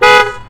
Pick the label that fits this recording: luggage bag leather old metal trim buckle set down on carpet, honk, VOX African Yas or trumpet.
honk